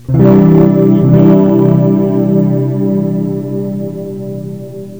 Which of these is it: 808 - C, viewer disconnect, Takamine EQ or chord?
chord